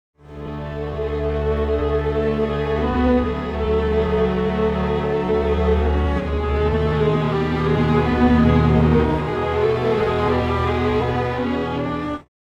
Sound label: strings, timestretch